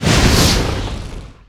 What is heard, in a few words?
Flame Spit Fire; Destruction